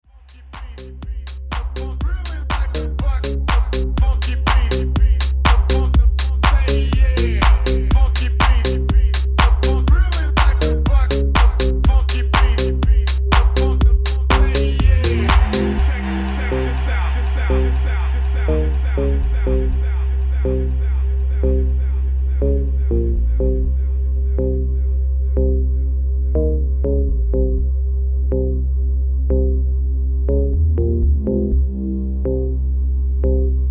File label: Funky Beat